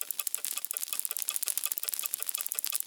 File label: bombs march; wilbur